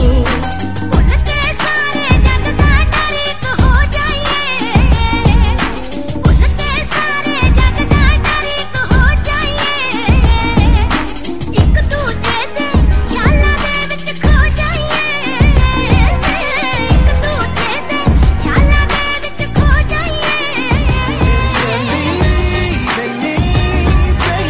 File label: punjabi